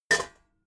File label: pots hit soft